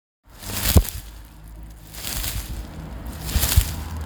Bush Rustle

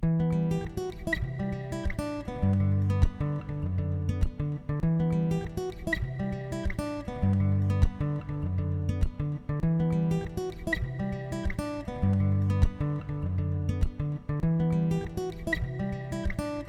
Beautiful Guitar

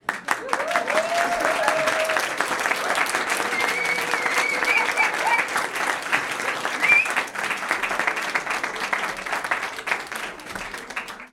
Small audience claps yahoo

